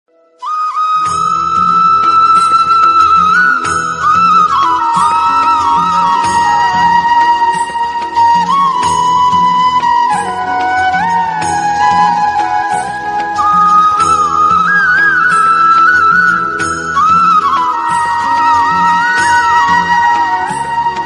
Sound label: flute instrumental ringtone; Krishna Flute Ringtone